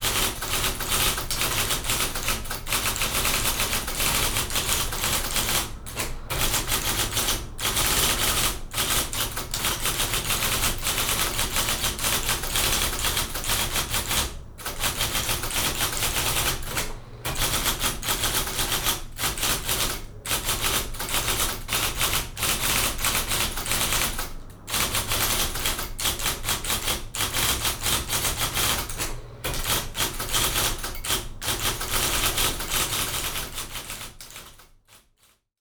typmachine

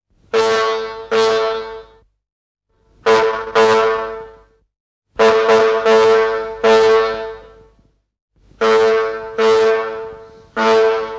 oude autohoorns

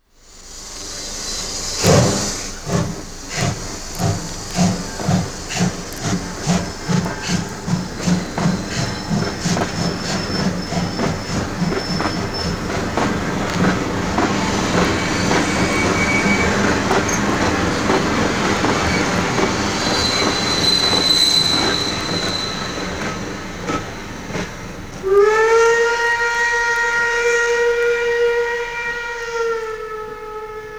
stoomlocomotief fluit

